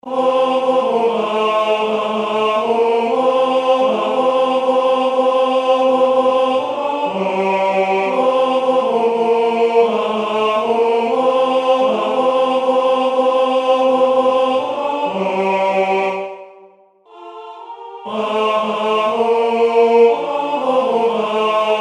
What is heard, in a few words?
De cap ta l Immortela tenor